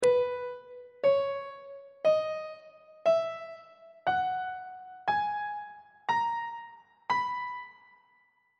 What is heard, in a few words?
b major scale on bass clef